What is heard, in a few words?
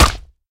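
hit weapon bone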